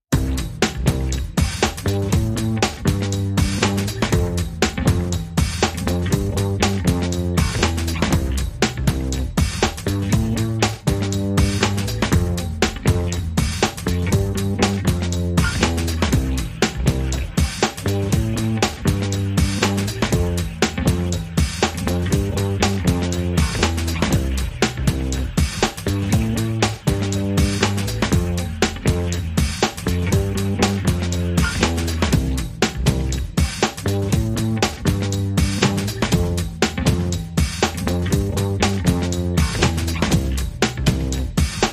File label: Drums and Bass